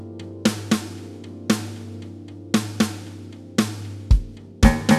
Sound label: add bass